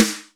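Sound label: snare, funk kits; Crypton - DrumBase5000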